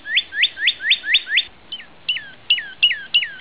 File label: cardinal